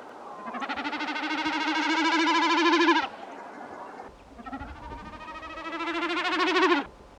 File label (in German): Bekassine